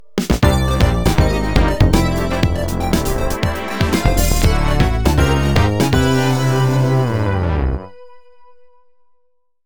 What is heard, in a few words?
Slick Logo Music; ripple dot extra 2013